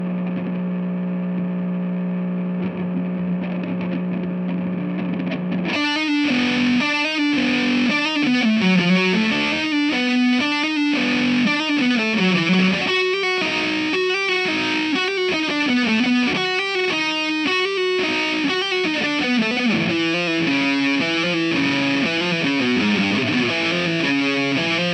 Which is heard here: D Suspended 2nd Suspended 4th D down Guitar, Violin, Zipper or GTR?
GTR